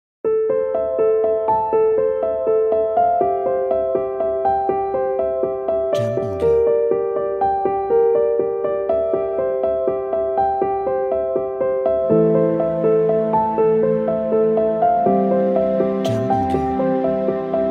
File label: Inspirational Piano